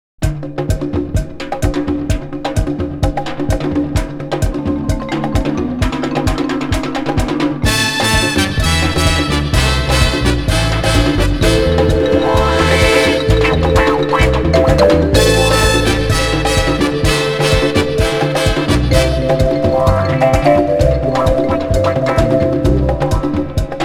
Percussion Storm

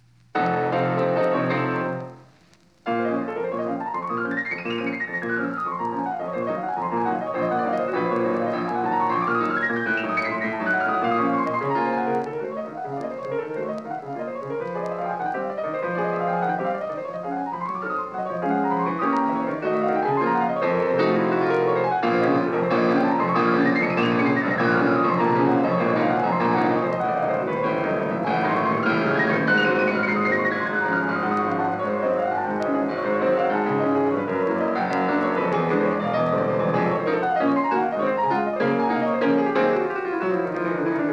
Prelude in B-flat minor; Frédéric Chopin

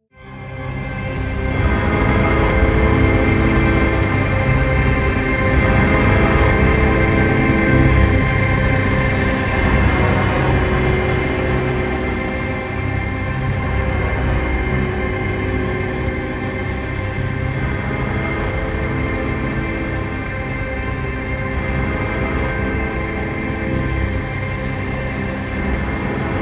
Tension Pads

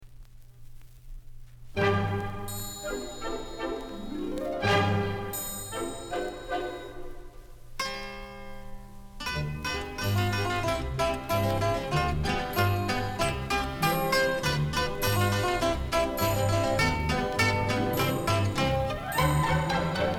Pizzicato Polka, Strings

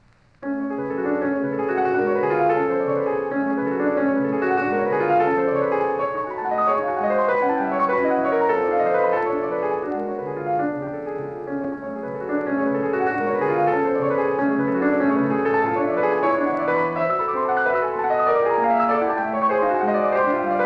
Prelude in F-sharp minor; Frédéric Chopin

